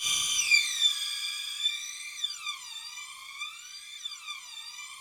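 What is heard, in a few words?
Rough Strings D5, Yamaha VP-1; 90 s Sample CDs; Music Store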